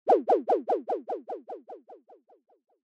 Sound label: Fantasize Low; 妄想05-1(低); シーン・妄想